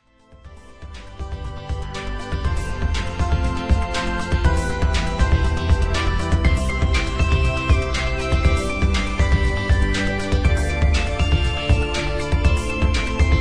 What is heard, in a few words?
industrial ice; tinellimusica